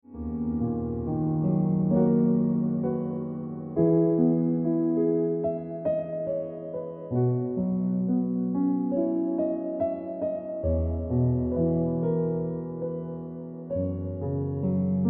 Ringtone

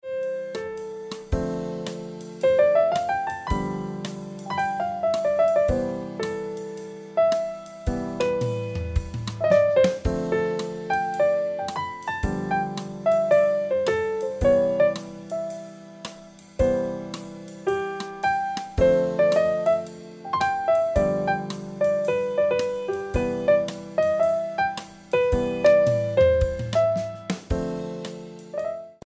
Piano Music

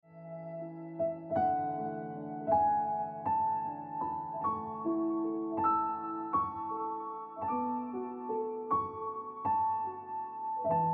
Happiness Of Waiting Ringtone Piano Music

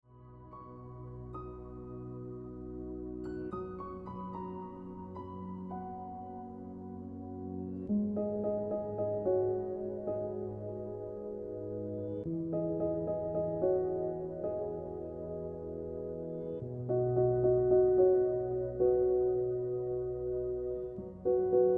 Piano Music

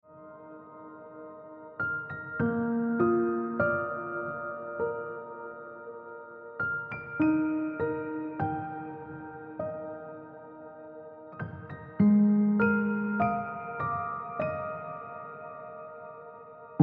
Piano Music

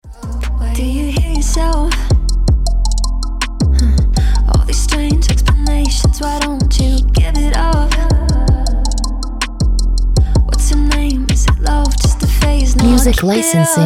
Lie Ringtone

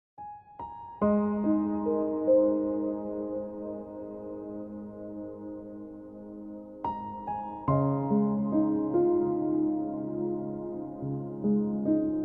Piano Music

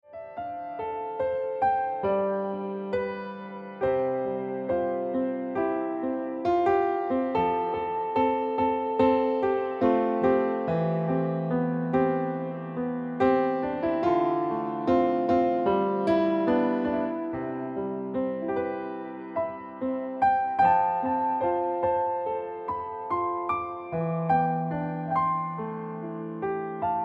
Ringtone Piano Music

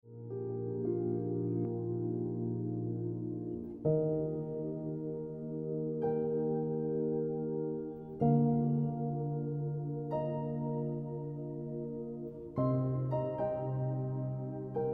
Piano Music

